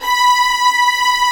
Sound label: STRING SECTION B4; STRING SECTION #1